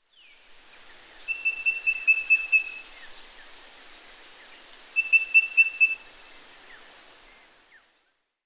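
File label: parrot sounds, collared parrot; Zvuki popugaev; Vorotnichkovyj popugaj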